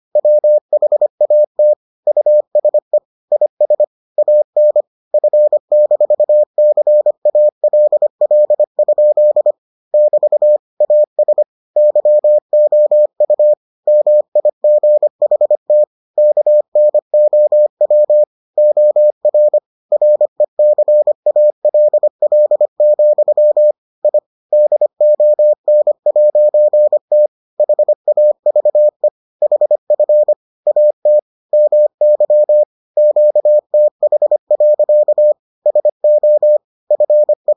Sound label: morse